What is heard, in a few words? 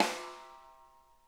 RIM, SNARE; Sonic Reality; Interactive Drum Kits